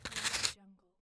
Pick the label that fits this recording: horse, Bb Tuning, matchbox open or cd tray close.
matchbox open